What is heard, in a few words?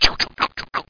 SCRUB; scrubber